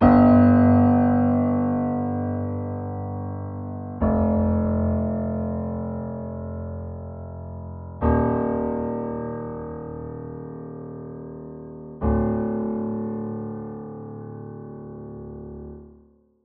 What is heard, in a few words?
Grave Low Piano 60bpm C min